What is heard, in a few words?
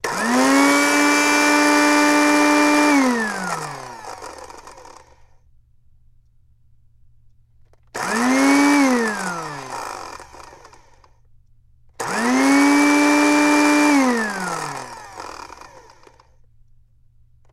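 Blender Dry Run Start; appliances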